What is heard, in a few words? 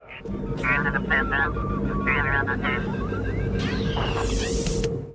Imperial Probe Droid; star wars